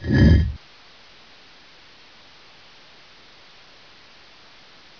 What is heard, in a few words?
stone out